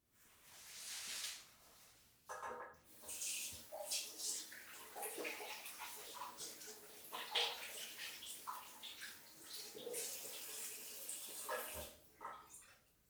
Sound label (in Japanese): 手を洗う